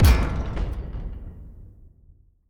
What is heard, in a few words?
Impact; 344 Audio; Epic Impacts Vol. 1